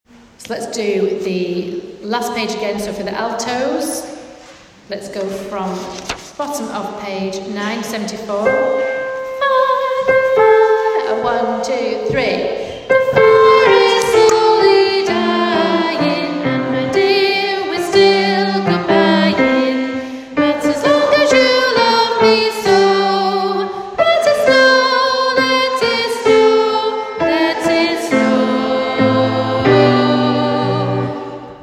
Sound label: alto